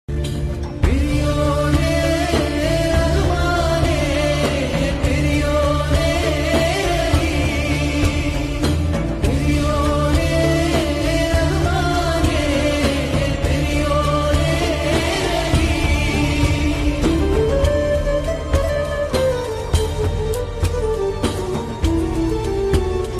emotion bgm ringtone; the goat life

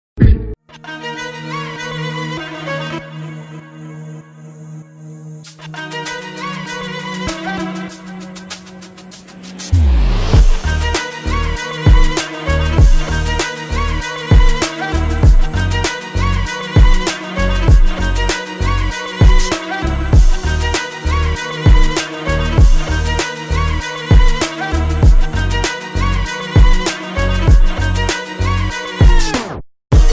attitude bgm ringtone attitude ringtone

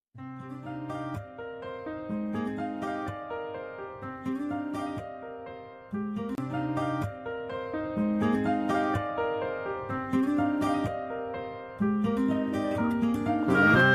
starting musical ringtone

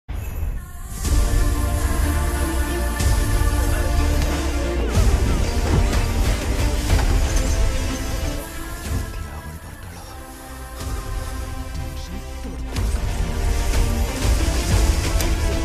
emotion bgm; Salaar; Hombale Films; Prashanth Neel

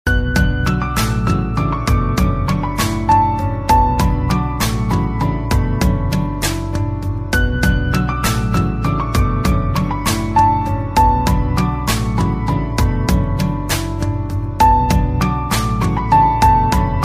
south love bgm

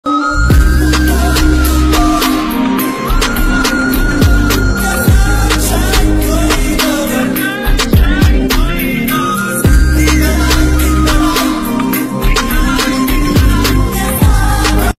minnale movie song remix love ringtone

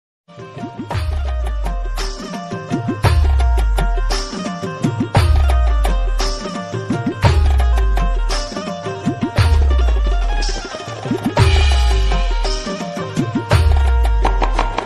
khwaja garib nawaz ringtone instrumental music

